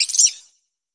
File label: bird eagle; Earth and Beyond; EA Games